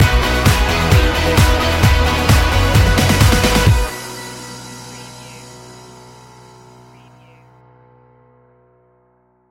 neon guitars stinger

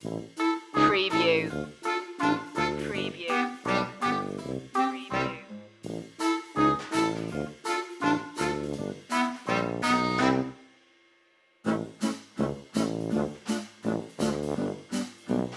less winds and horns no piano

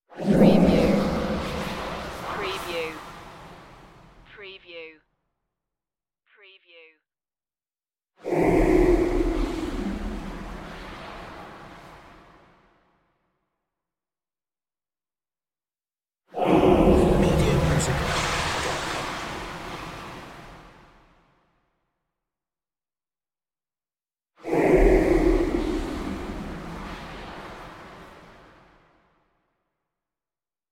evil storm blasts

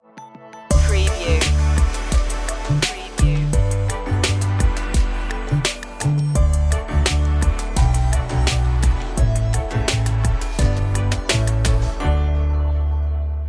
kids hip hop

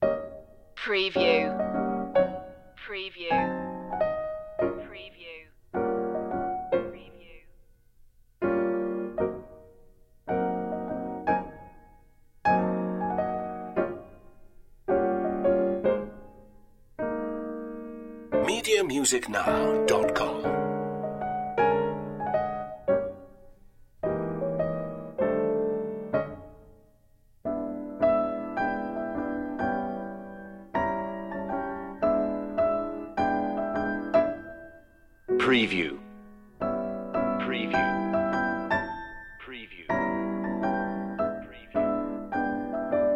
piano only

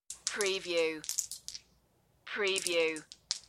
Dice in Hand

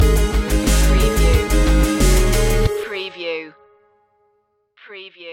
land attack stinger

